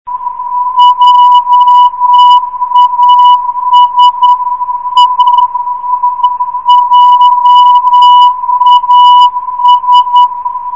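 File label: jas 341.5khz, non-directional beacon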